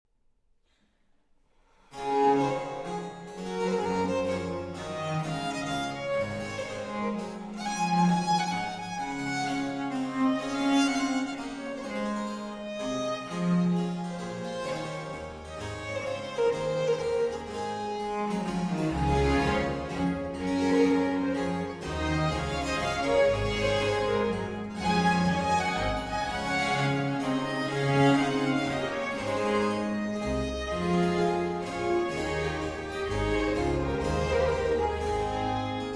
Suite in D Major For Strings II. Air

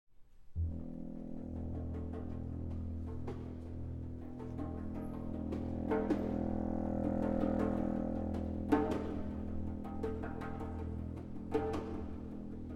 Alto Saxophone, Percussion and Orchestra; Double Concerto

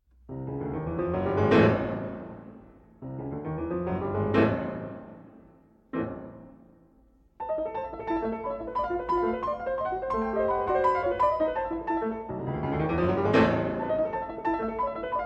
Etudes tableaux, For Piano; Op. 39 No. 2 in A Minor

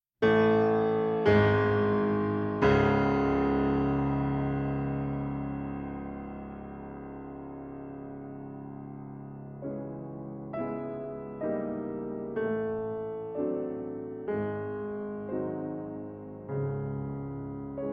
Prelude in C-Sharp Minor; Morceaux de Fantaisie, Op. 3 No. 2

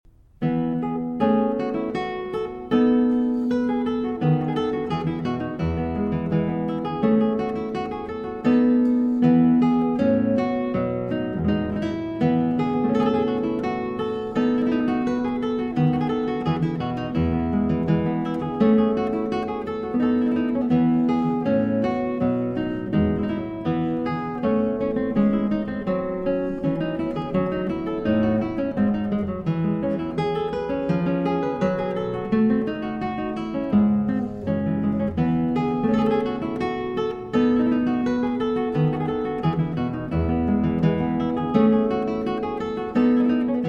Violin Partita, Gavotte; Violin Partita No. 3 in E Major; Arr. P. Galbraith; III. Gavotte en Rondeau

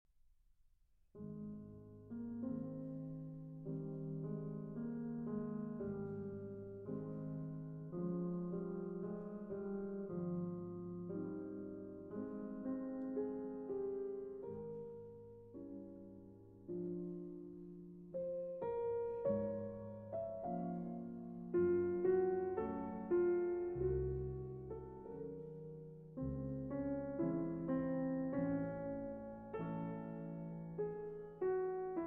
Piano Sonata in C Major Andante tranquillo

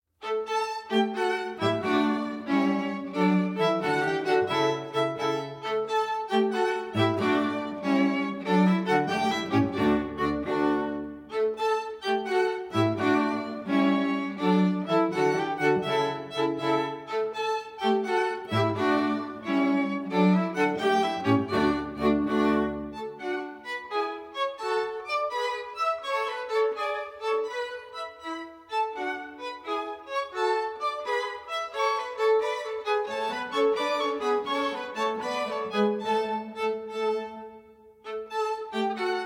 String Quartet in D Major; Op. 1, No. 6 Rondeau

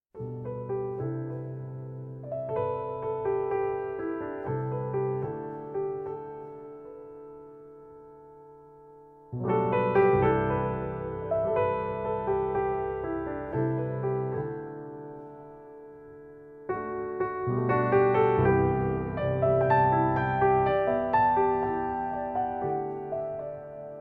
Solo Piano Version

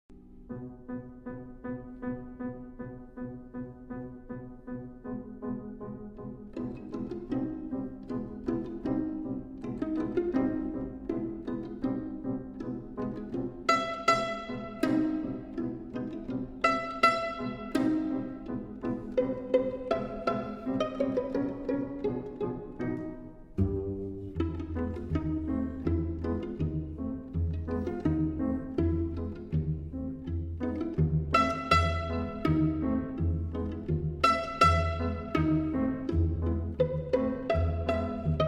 Piano Trio Allegretto - Adagio

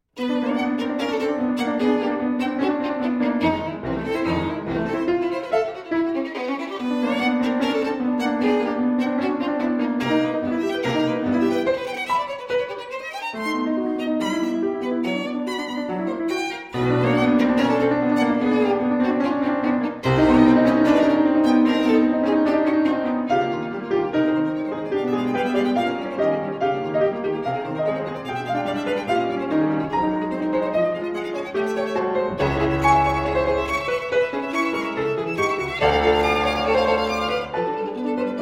Sonata For Violin and Piano, Presto Tragico

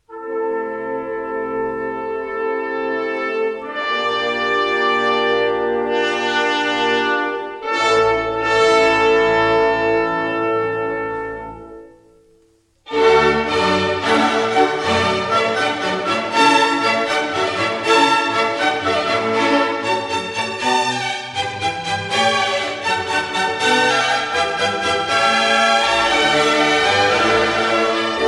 Water Music Suite in D Major, Allegro

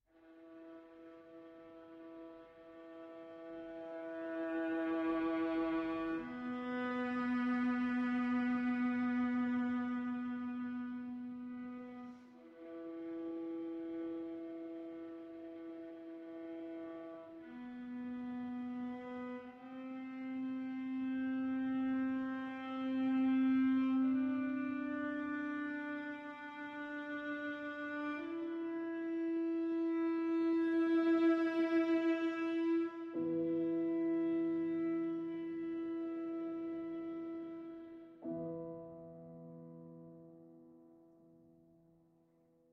Viola Sonata, Andante religioso

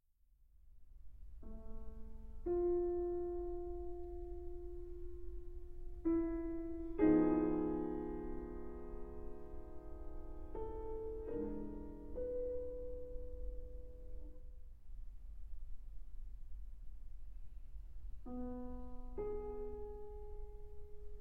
Einleitung For Piano; Wagner; Tristan und Isolde, WWV 90; Arr. Kocsis

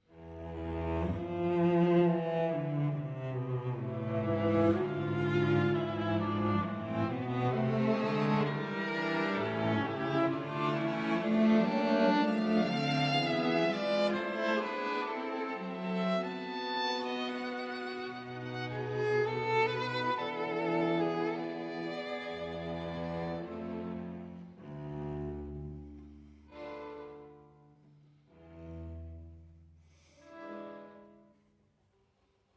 String Quartet in A Minor, Finale

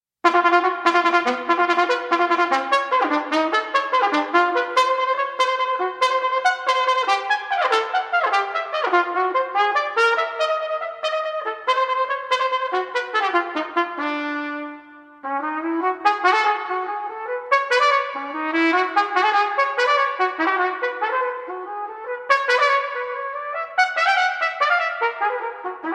Unaccompanied Solo Trumpet; Suite For Unaccompanied Solo Trumpet V. Revenge

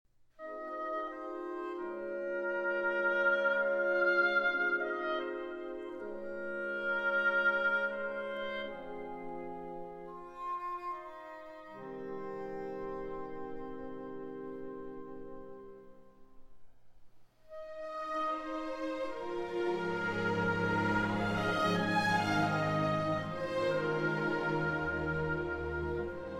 Symphony in C Minor, Andante Ma Non Troppo; Price

